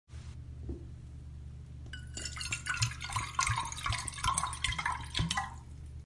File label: water pouring; theatre project